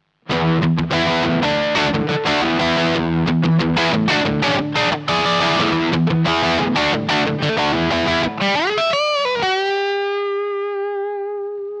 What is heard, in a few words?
Bypass; MAXON PT9PRO+